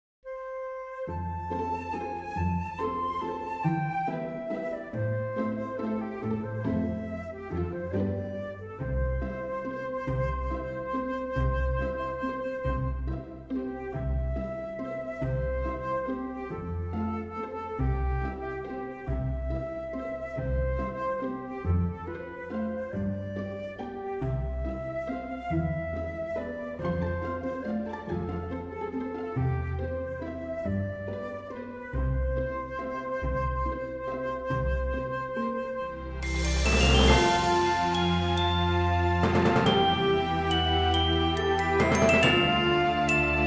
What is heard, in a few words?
Waltz